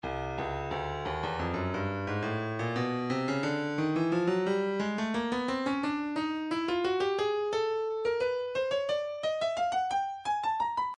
breakbeat midi files 085 089 bpm; barrio d; Stylus Breakbeat Midi Files

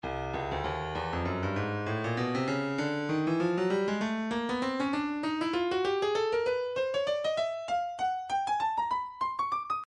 breakbeat 098 bpm; lift c; Stylus Breakbeat Midi Files

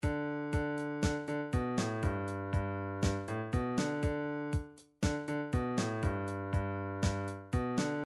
drums reggae

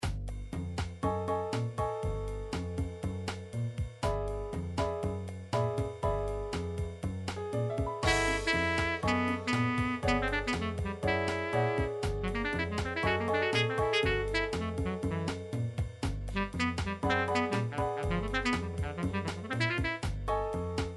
bebop blues, midi

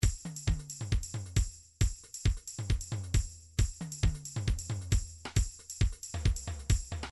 trance midi loops beats punch; Newtronic Trance Constuction Kit

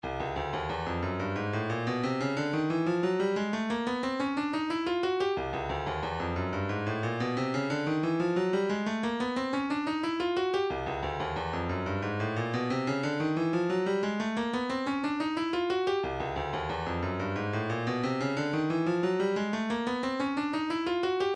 shakers, midi

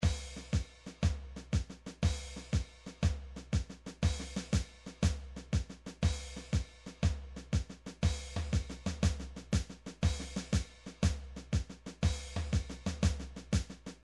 drums march, midi drums

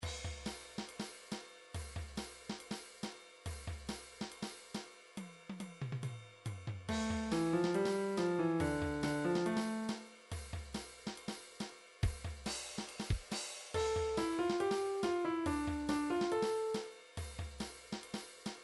rock remix; gameboy tetris music a

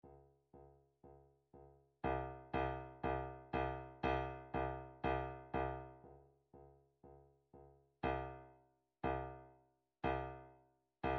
drumtrax toolbox kick

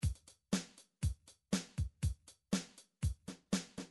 midi drums

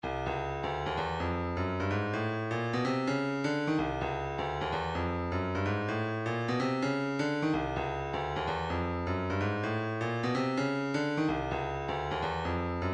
kick, midi; 128 Keep Movin

